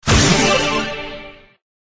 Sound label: roulette open ball tips